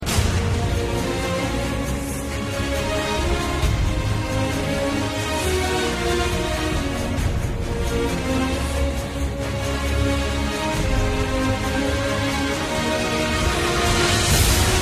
Kriegsmusik

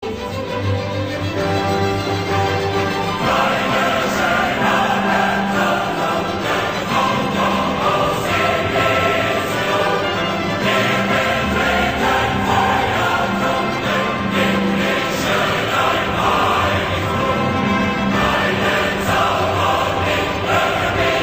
Europaeische Hymnen

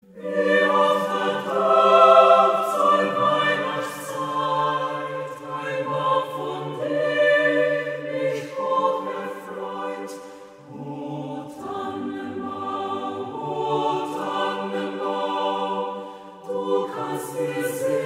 O Tannenbaum

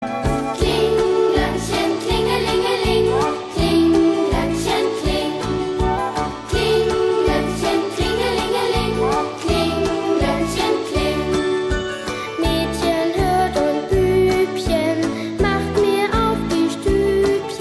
Kling Gloeckchen

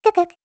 Guck Guck

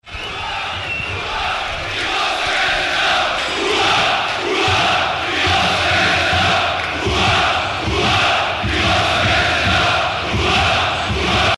Stadion

